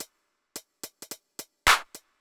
clapper 108

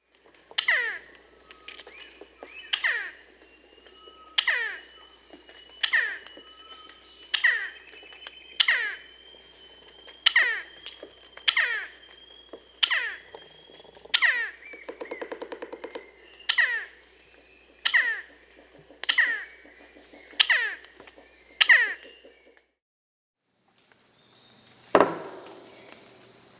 Red-necked Woodpecker (Campephilus rubricollis); Peru; S. America

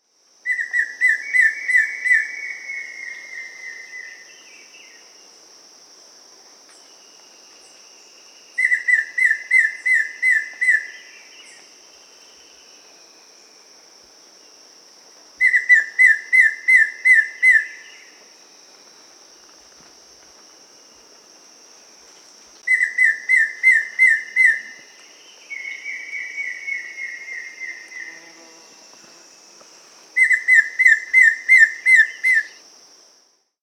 Goeldi's Antbird (Myrmeciza goeldii), Peru; S. America

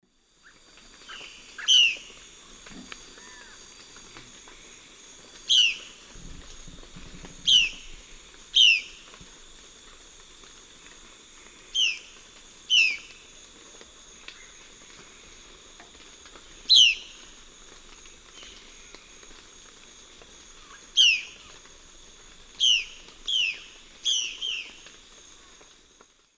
Cyanocorax violaceus, calls; S. America; Eastern Lowlands

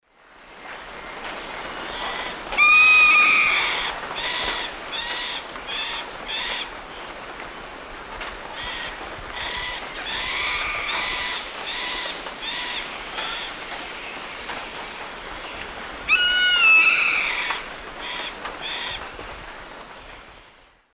Daptrius ater, calls; S. America; Eastern Lowlands

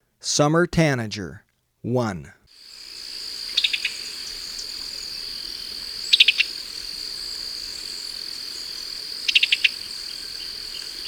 Summer Tanager, Birds of Ecuador; S. America

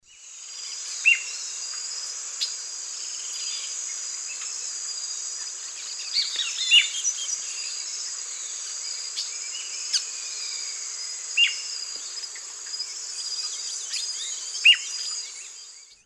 Turdus ignobilis, calls; S. America; Eastern Lowlands

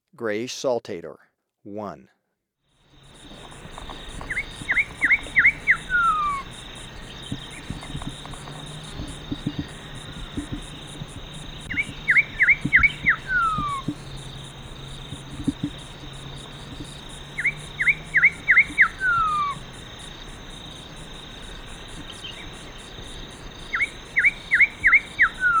Grayish Saltator, Birds of Ecuador; S. America

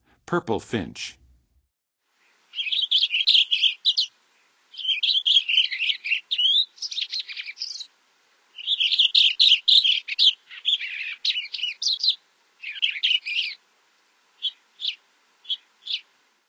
Purple Finch, Songs of Birds of North America; Western USA; Western USA Songbirds

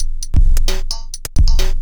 HiHat Perc Loop, Dance Beats

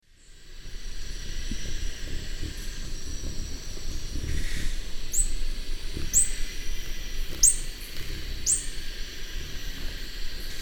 Phaethornis atrimentalis, flight calls; S. America; Eastern Lowlands

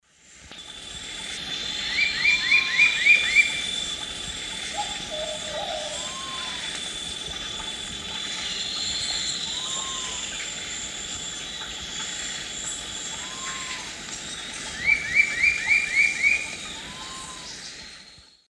Campylorhamphus trochilirostris, song; S. America; Eastern Lowlands

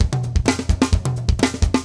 metal

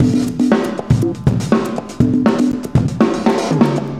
cooling 120, loops, 120bpm

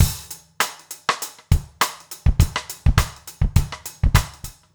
basic funk 100 rims

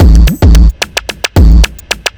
block rock kit

